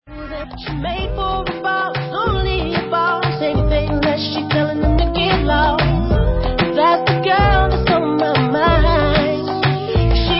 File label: remixes